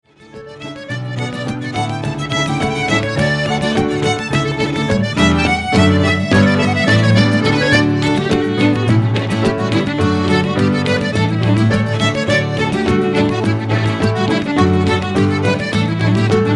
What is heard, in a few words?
Golden Keyboard